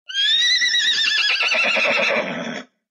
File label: horse